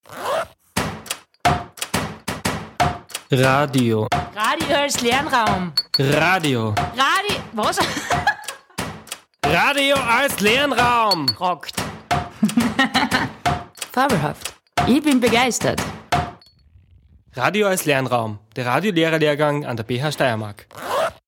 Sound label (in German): Geraeuschebeat Lernraum